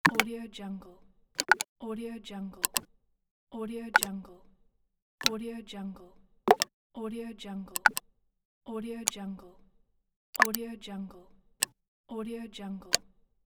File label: clicks